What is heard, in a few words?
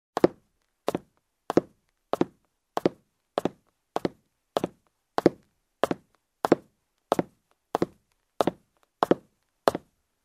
Steps on a wooden ladder in shoes